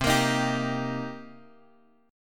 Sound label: C Minor 7th C Guitar Drop D